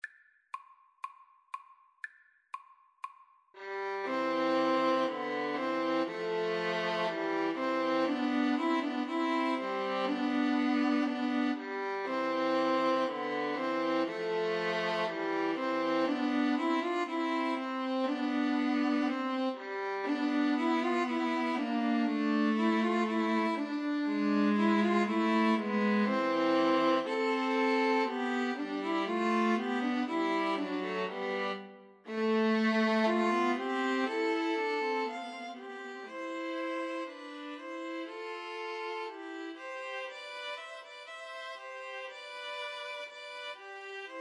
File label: string trio